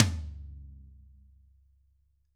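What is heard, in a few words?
tom 14 hit; ILIO; Double Platinum Drums 2; TAMBKIT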